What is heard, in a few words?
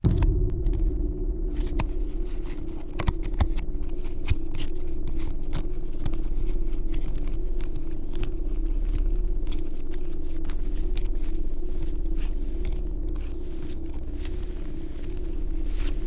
casino cards; 344 Audio; Casino Cards Vol 1